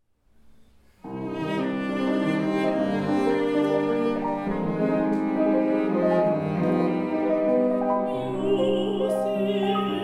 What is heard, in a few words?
Music when soft voices die; Bridge